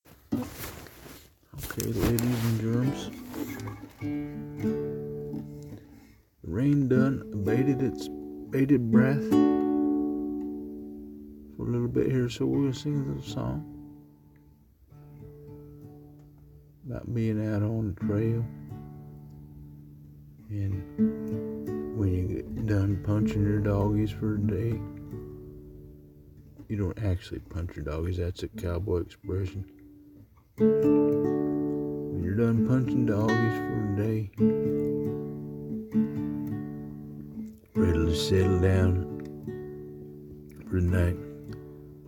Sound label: cowboy classical guitar, campfire song